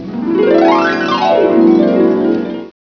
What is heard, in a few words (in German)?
Harfe lang